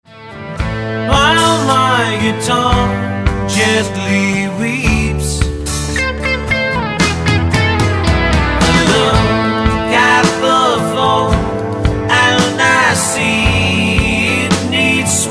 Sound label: My Guitar